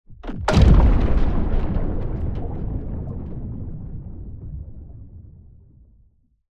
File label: large underwater explosion